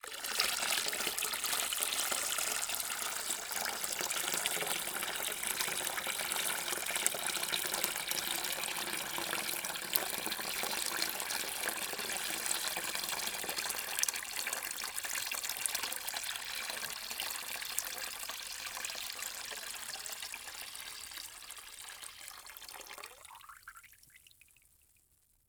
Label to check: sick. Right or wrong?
Wrong